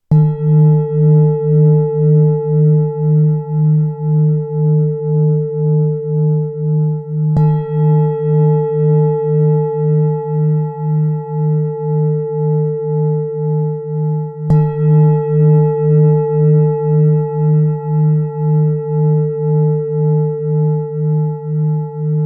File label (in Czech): tibetska misa; d3; 21-5cm